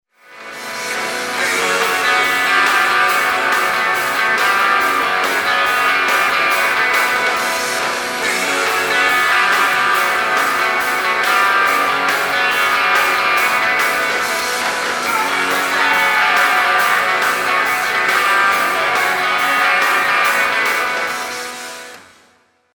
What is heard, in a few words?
guitar